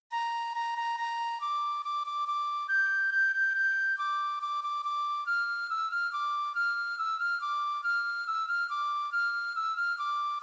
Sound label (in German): Floete, 140; Carol of the Bells